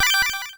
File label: boot skydiving clink